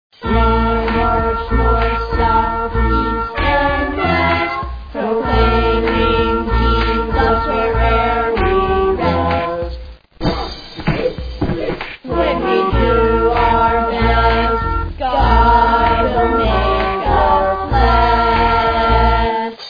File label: marching song